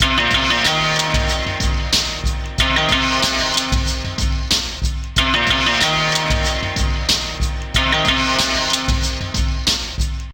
dulcimersville

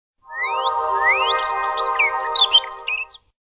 bird notification tune

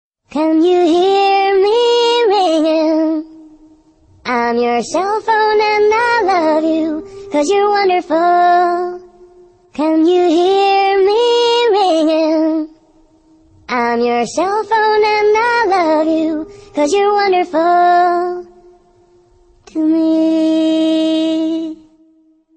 your cell phone ringing

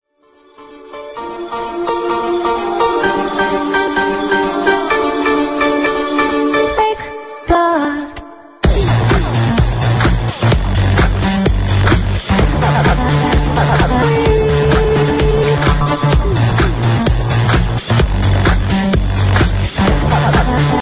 hiphop

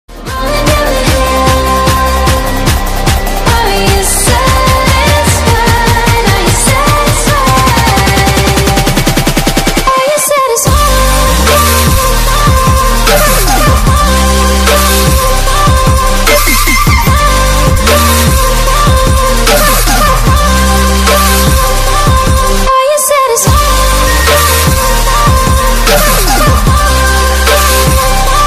beat drop

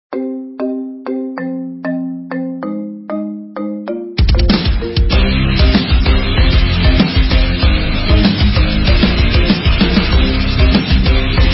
marimba mix

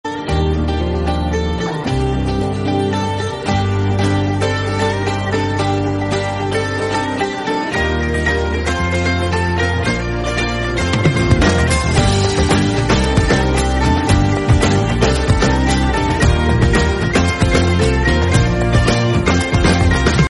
madari guitar

